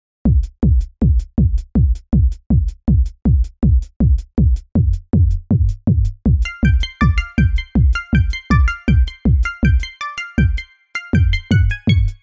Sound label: MIDI Ditty